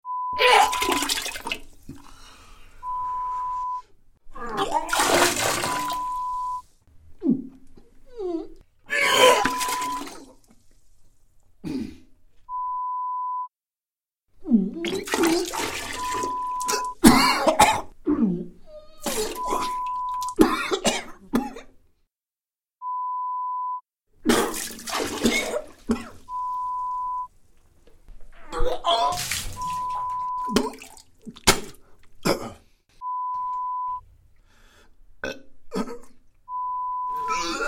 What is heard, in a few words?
Vomiting sound